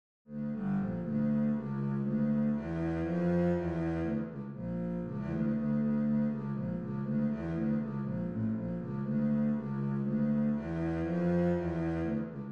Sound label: bulgarish bass